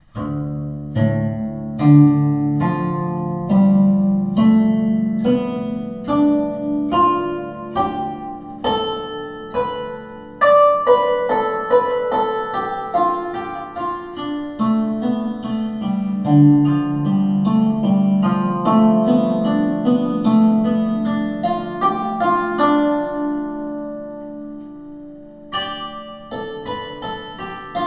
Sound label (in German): Pentatonik Anhemitonisch Weitere